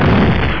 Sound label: menu change; ld21 ESCape